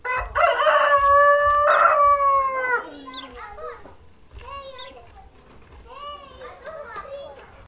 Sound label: rooster crowing and children playing, Recorded outdoor in a chicken backyard